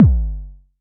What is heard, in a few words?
Short, Kick, Traditional; Multi-Kick; Long